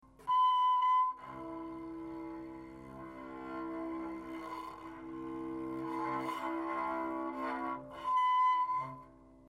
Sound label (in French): archet mix, harpe; touchers de la harpe